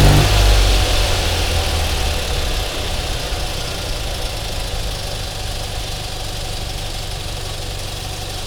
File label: v8 throttle off fast loop; commoncar